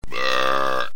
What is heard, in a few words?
BURP